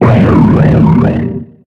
Grito de Cofagrigus

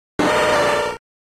Grito de Machamp

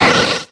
Grito de Lileep

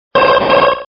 Grito; Xatu

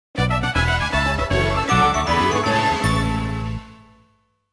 Tema de victoria; Kirby; SSB

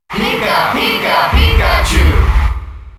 Grito del público Pikachu; SSBM

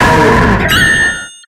Grito de Bastiodon

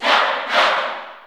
Grito del público Inglés NTSC; Duck Hunt; SSBU

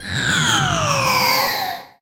Grito de Chandelure

